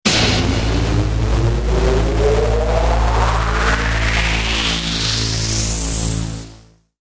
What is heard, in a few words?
Flash Forward Alert Tone